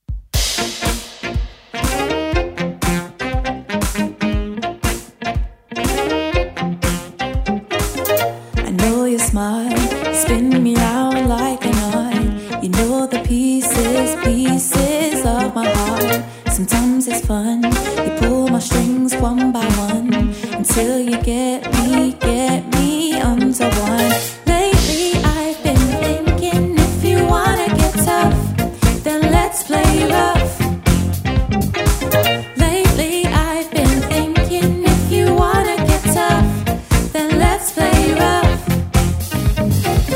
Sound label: RnB Band with Female Vocals